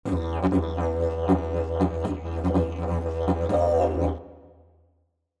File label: ethno didjeridoo